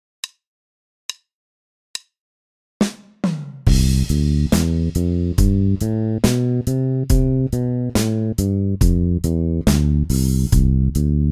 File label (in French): sur 1 octave, gammes, basse; exercices